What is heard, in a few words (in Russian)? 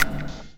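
звук двери в вагоне метро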